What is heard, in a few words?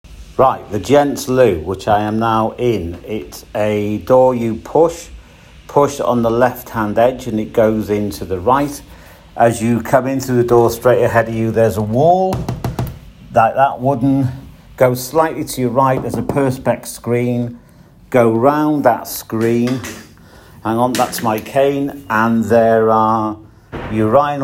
Male toilets near the bar; Conference venue